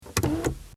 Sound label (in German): Auto Schiebedach